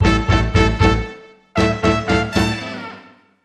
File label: Hit